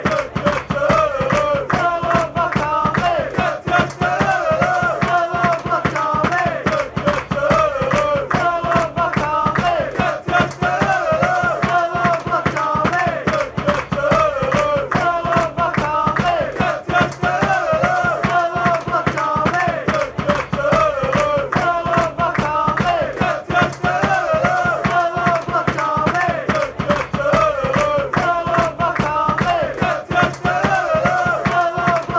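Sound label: yellow black army, football chant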